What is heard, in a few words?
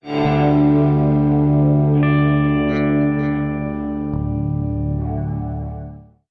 guitar, Sad